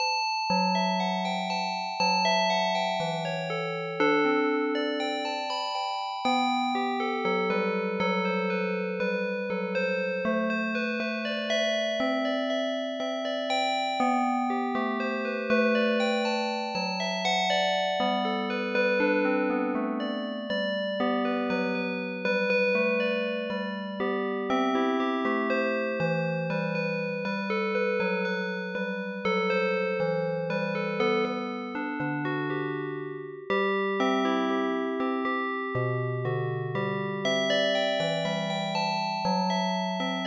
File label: cmaj